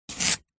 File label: carrot chop knife single; food